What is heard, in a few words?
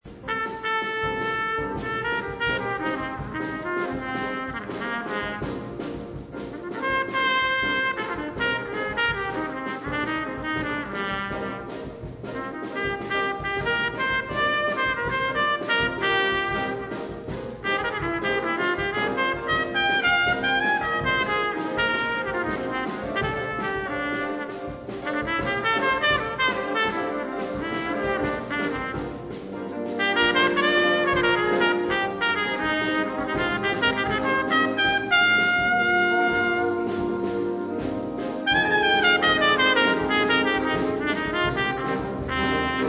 solo